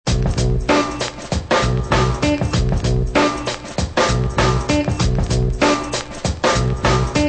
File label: drum loops